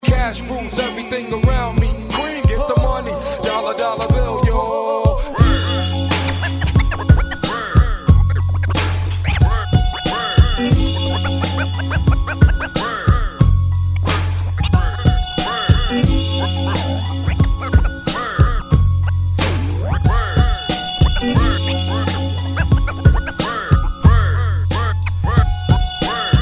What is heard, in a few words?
scratch instrumental